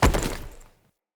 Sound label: Foley Action Default Body Fall; Player